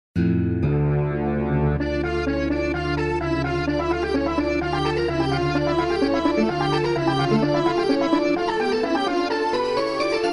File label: Orchestra furioso; orchestre furioso